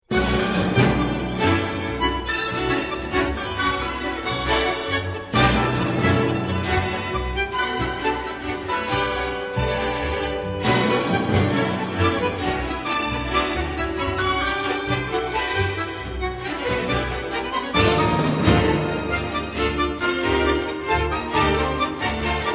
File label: Concerto For Bandoneon; Piazzolla; Aconcagua